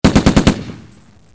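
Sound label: 50 Cal MG Sound, .50 calibre machine gun; Close Combat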